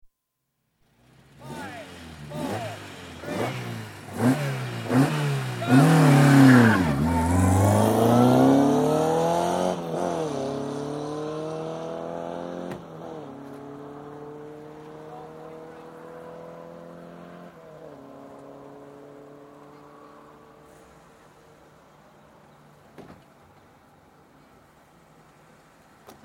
Rally Car Racing, cars at start of race with starter giving countdown; Racing Sound Effects; BBC